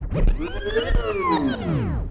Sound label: reverse